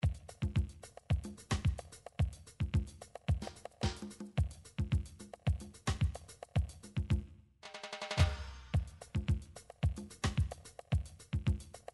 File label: drum midi files fusion; Drum Midi Files Collection